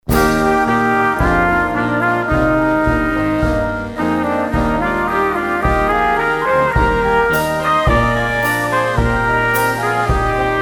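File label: national anthem music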